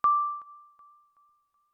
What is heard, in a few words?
beeps; pygame